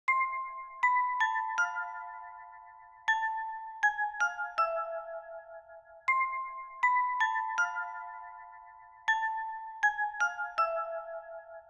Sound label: Bells, Hip Hop Exotica, Apple Loops; Big Fish Audio